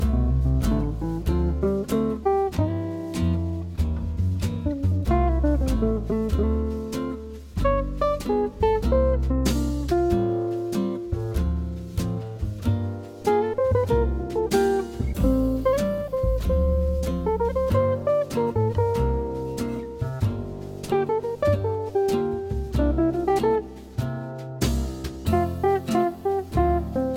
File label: Soft Smooth Jazz